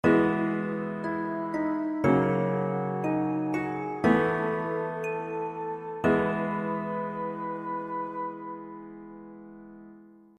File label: jingle waking up; newts jingles